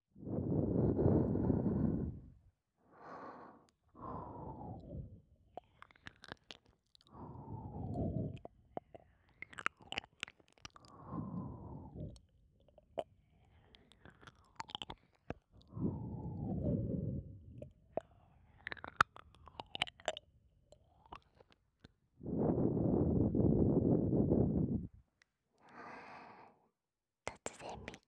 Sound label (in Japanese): ジェルボールもみもみ＆耳舐め